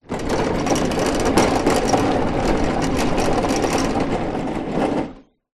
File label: lawnmower push concrete motor off